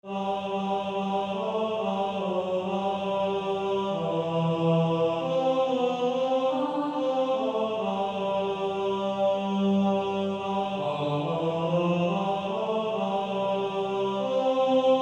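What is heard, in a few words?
Male Choir, tenor 2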